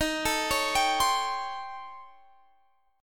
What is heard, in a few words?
A 7th Sharp 9th Flat 5th